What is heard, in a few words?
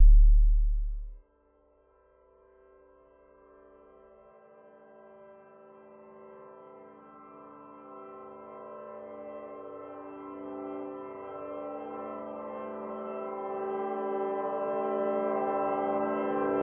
Synth Start; Game rip; MiSide